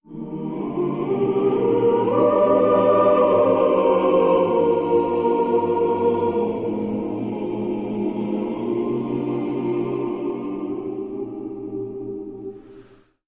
voice choir of oohs; Loops; Sad

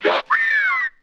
rock whistle

